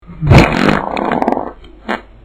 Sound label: fart